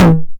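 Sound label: 909 mid tom tape saturation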